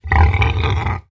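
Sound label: hoglin retreat, 疣猪兽：退缩; Minecraft; 我的世界; 疣猪兽是一种生成在绯红森林的攻击型生物。它们是目前唯一可繁殖的攻击型生物。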